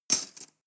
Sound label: cutlery teaspoon drawer place hard